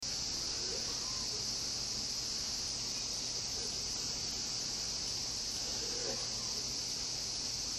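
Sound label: window closing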